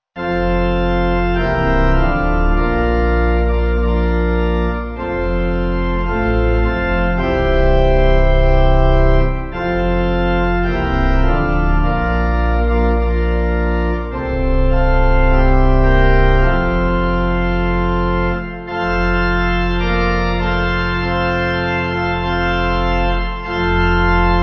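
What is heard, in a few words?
Pipe organ, church music backing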